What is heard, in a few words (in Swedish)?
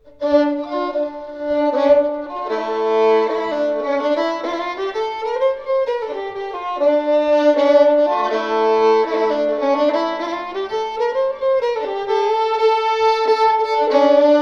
Jonk, Medelpad; Jonk Jonas